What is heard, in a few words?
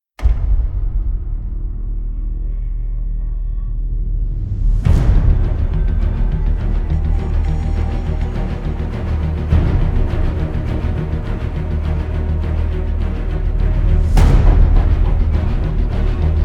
TRAILER DARK EPIC; Shadow Hunters